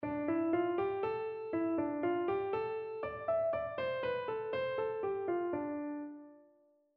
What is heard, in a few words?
Folk Dance